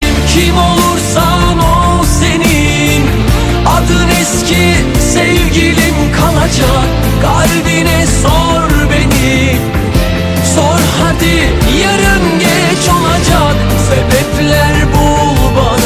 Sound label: Turkish